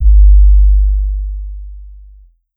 Kick

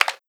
Clap Click; pitchglitch

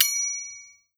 Perc Triangle

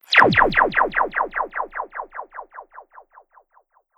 Old Dub

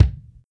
Kick

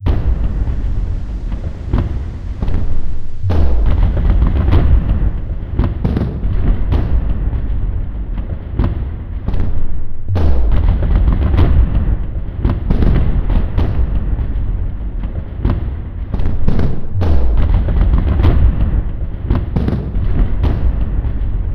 warfare

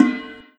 Perc Metallic Bowl

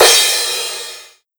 Crash Hit; Classic626; pitchglitch

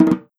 Perc Djembe Roll

